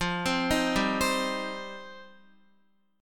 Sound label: F 7th Suspended 2nd F down Guitar; F7sus2; arpeggiated